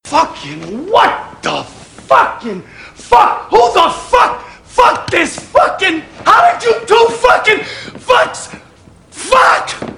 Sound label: fail; freak fortress 2; kaizo